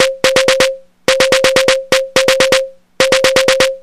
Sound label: Ambient House High Tom 125BPM